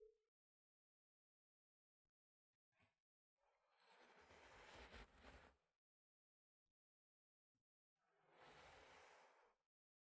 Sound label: Moving Chair On Carpet